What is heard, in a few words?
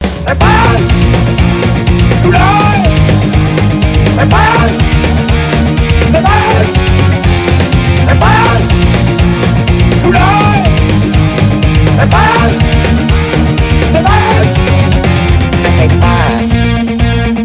rock version